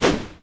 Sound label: normal swing